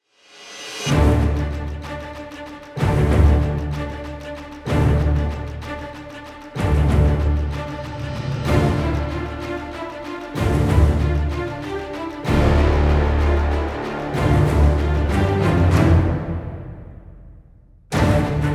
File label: no choir